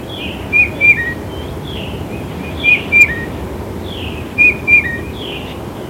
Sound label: Tufted Titmouse Pete peter